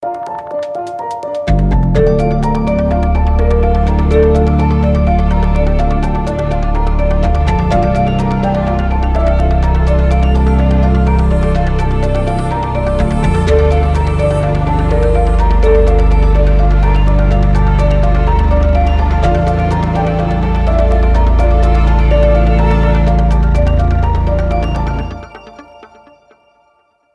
Guitar theme; Terra Invicta

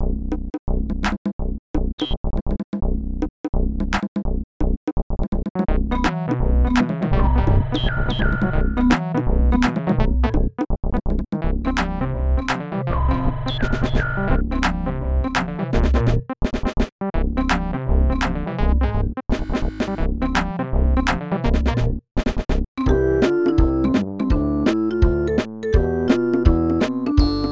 Level music; qbert 3 1992 snes

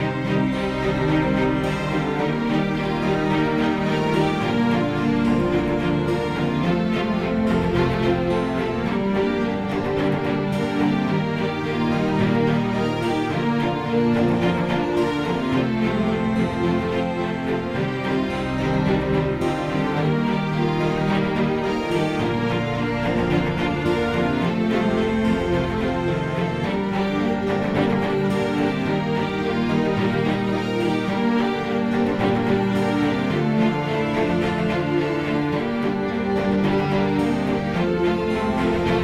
loop Sad; the walking dead michonne 1 in too deep

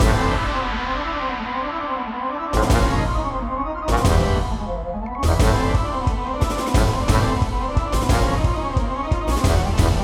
Boss Music; Yono and the Celestial Elephants

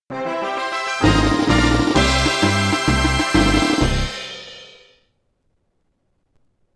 Victory Fanfare; Guardian's Crusade

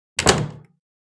Door close

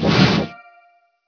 portal transport

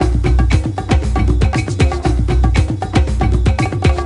Tribal; chipper and sons lumber co

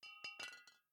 Bottle Shake; The Town

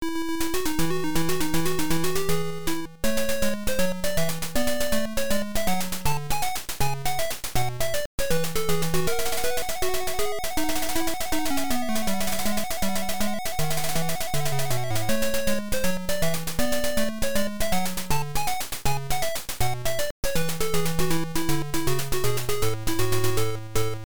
Game Set (Lose); Pro Yakyuu Family Stadium Pennant Race; MSX2

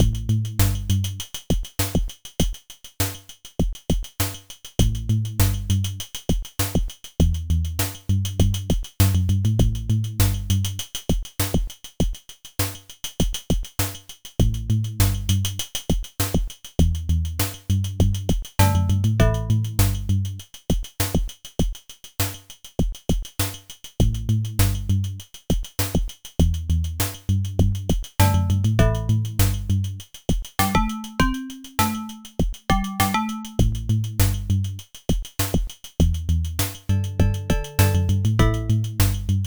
Funky (Drum Blaster version); Jill of the Jungle; Epic Games

